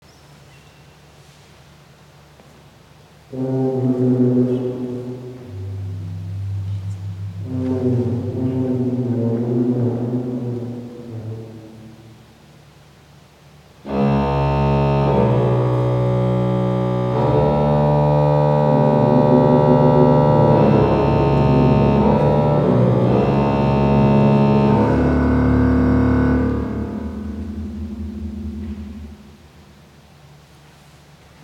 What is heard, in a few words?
improvisation; konzert; nurnberg